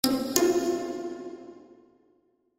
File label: Chime Musical, Chime Musical Multimedia